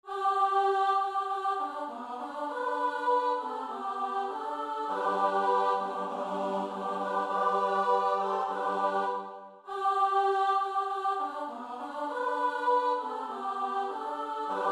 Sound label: midi; od niebiosow nad betlejem